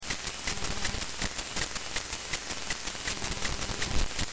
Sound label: annoying digital noise